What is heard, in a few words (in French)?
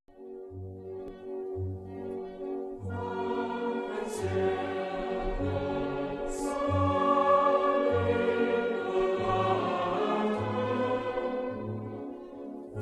orgue; EGLISE DE GUIGNICOURT; CONCERT ENSEMBLE MOSAIQUES